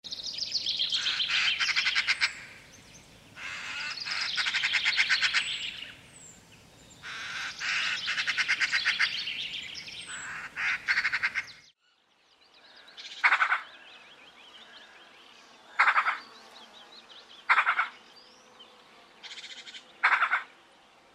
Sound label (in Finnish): harakka